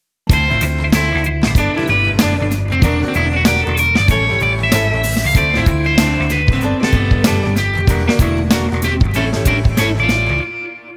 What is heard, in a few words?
shuffle